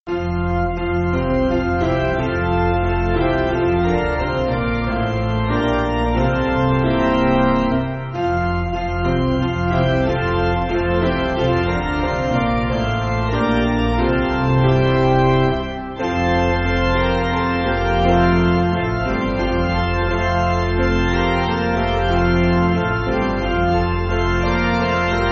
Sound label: Jesus Christ Was Born Today, piano